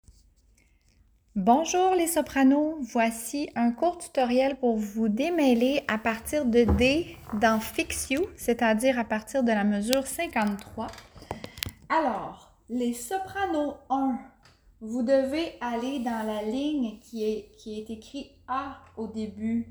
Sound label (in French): Tutoriel partition Soprano